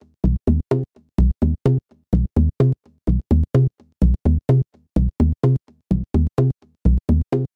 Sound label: 127 Bpm Funky Dub Bassline